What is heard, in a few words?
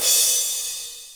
soft hit finish; osu